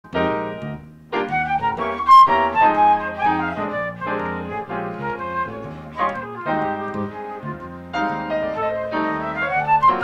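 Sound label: Fender P Bass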